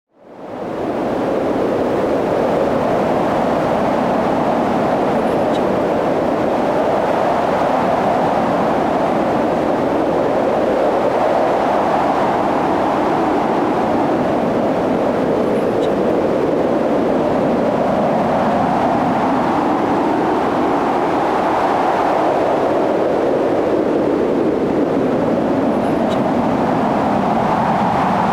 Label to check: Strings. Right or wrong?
Wrong